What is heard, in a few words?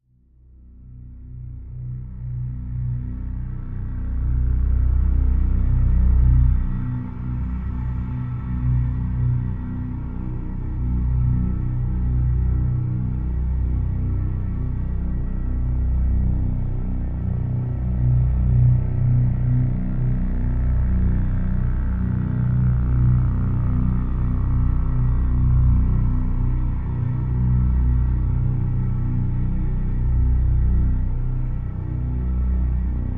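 Drone Reactor B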